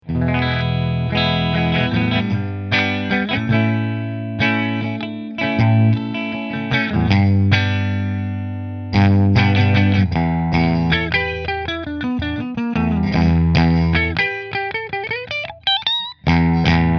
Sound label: Heritage Middle Fender, guitars